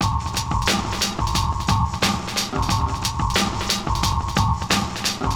Drum Fx Loop, Electronica

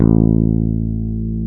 bass, A1

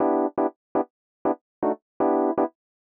120 Rhodes E, Keys, Nu Jazz House; PowerFX

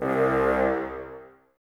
BNS C 2, Bassoons, Wood Winds; Miroslav Vitous - Woodwind Brass Ensemble; Bassoons Links

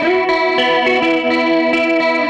Gretsch Guitar Loop

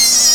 Kevin Screams; Home Alone

